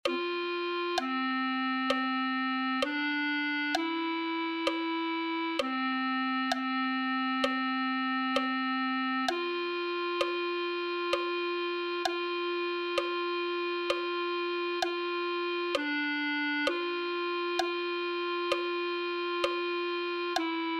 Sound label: clarinet